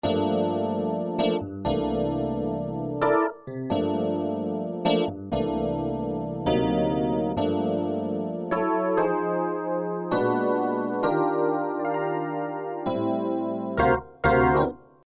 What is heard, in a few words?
tines; korg n1r